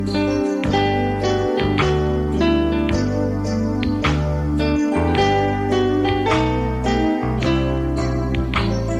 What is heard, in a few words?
Ringtone; Pathfinder